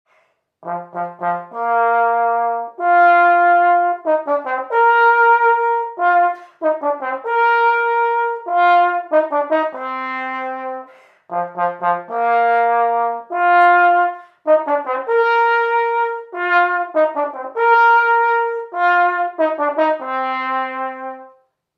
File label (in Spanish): trombon; Sonido del instrumento; Alexander Cruz